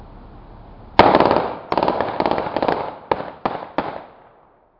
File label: 30 plus 3 singles full auto